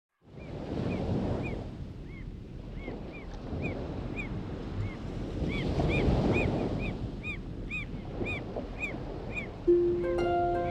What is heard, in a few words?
Ocean Whisper; Huawei Mate XT Ultimate